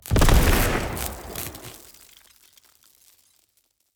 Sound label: environment zone electro explosion